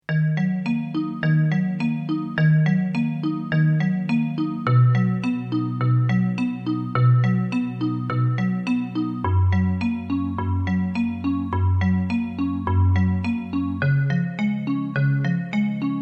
bittersweet xylophone

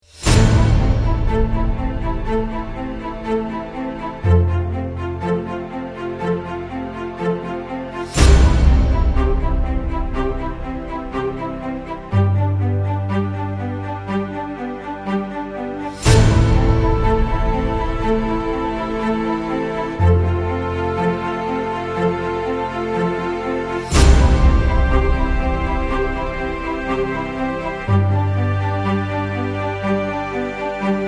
berlin strings

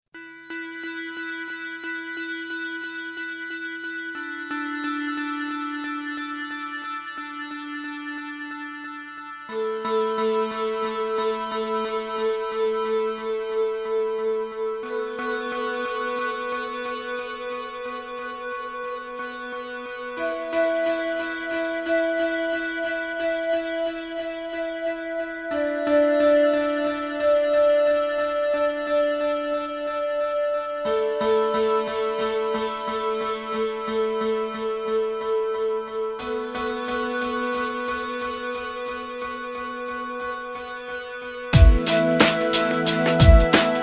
dance

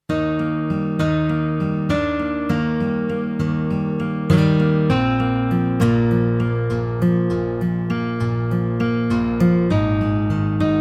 sweet guitar

